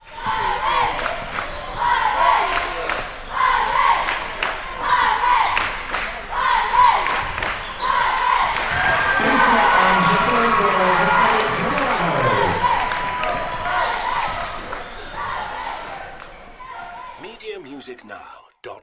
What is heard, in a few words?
Basketball Cheerleaders Chanting with Announcer Speaking